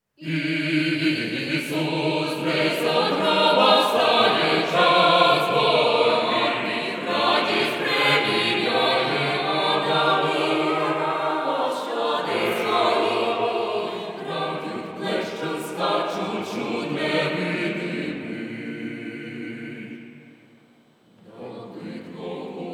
Ukrainian folk group Easter Chant

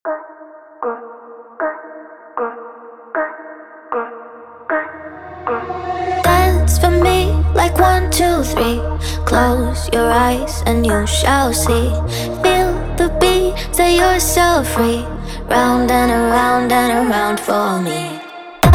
Stutter Techno

